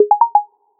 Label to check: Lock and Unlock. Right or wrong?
Right